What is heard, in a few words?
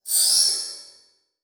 Title Transition Crystal Glow; Adobe; Production Element